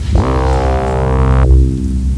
dispence

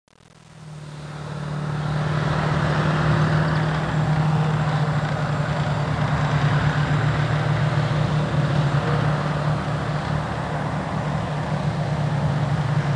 ambien; Vietnam A Visual Investigation

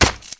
Stab

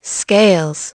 SCALES

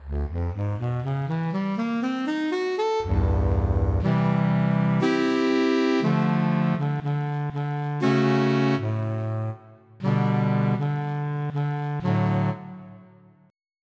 Soprano Sax; EverQuest

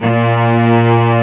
Arco Celli A#1

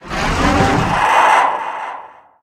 mine cart creak; darwinia demo

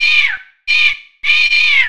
Harpy; Hellboy

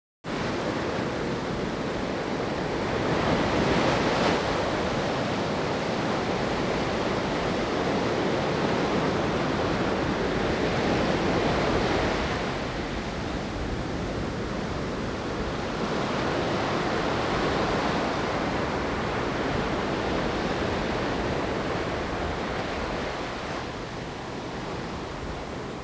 STORM; ambient; weather

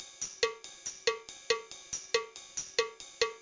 drums; Pong; music; ice

